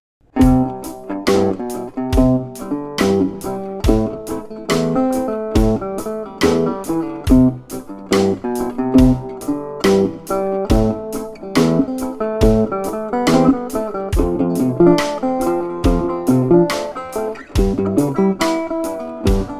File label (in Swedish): Resonator mikrofon